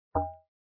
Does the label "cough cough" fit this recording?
No